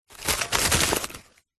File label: food candy boiled sweets unwrapped pour from metal scales into paper bag